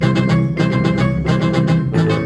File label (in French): vaches